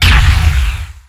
bash stun; weapons; dota; slardar

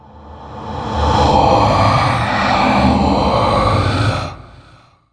incant; warlock

